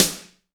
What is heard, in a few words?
MIX SD, DRUM RYTHM; AKAI S6000 CD-ROM - Volume 1